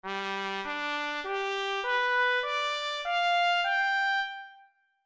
lituus; AJ Hipkins

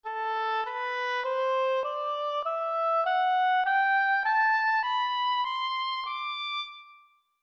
sheng; AJ Hipkins

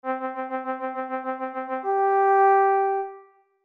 teruah; AJ Hipkins

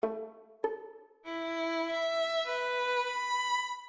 crwth; AJ Hipkins

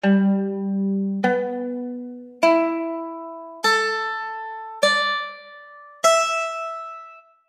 mandoline; AJ Hipkins

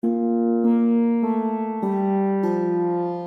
sitar; AJ Hipkins

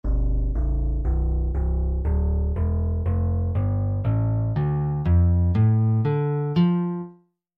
lute; AJ Hipkins

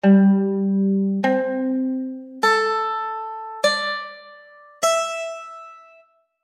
mandoline; AJ Hipkins

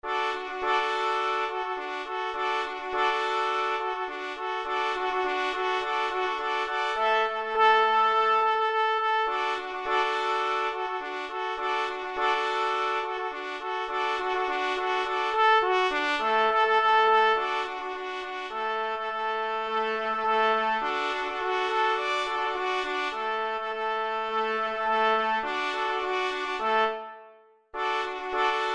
trumpet; AJ Hipkins

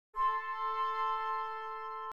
trumpet; Nikolai Rimsky Korsakov

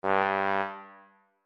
lituus; AJ Hipkins

